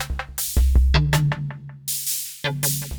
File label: PERCUSSN, AMBNT, 160